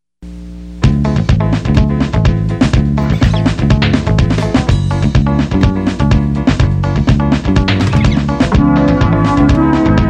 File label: Fusion